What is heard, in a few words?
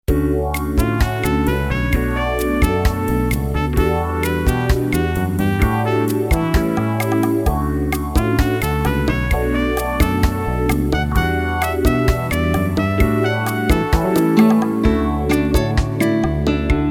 Salsa, Gitaarboek